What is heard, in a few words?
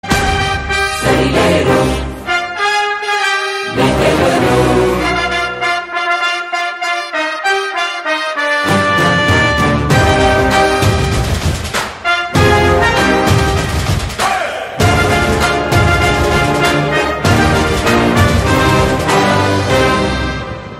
Anthem BGM; Sarileru Neekevvaru